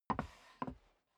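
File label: step boots wood creak scuff, footsteps; schritte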